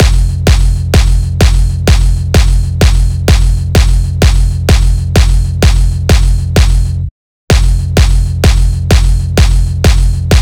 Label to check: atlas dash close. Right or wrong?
Wrong